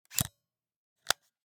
Camera, Pentax KS2, DSLR, mechanical, flash up, close, XY; Eiravaein Works; Vaeyan IV